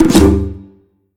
UI Multiplayer Match Found; hero defense haunted island

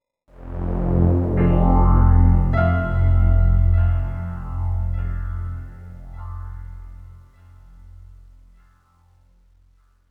Oneshot Synth And Piano; zombie army 4 dead war; ps4 stadia switch windows xbox one

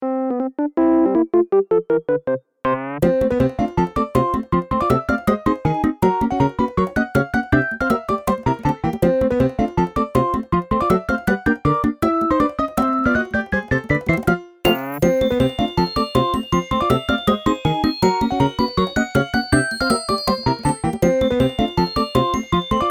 Bonus; New Super Mario Bros. Wii; Newer Falling Leaf

